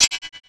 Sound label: FIRE GOBLIN hit, game; Eft